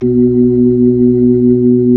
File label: B-3, Keyboards of The 60's and 70's; Club Xfade